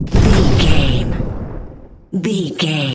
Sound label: sci-fi explosion